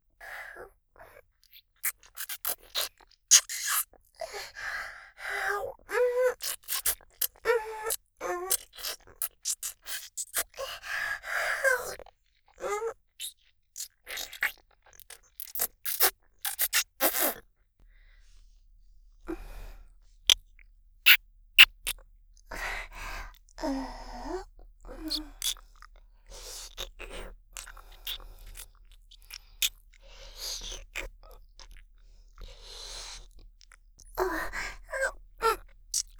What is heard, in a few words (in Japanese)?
フェラ 連結版; ありすちゃん（リスみみの地下アイドル＋コンビニバイトAさん）